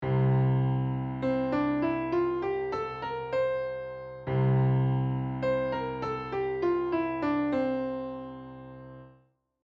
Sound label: modes mixolydian